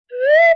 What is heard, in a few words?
Slide Whistle Short; Vintage Cartoon; Musical Elements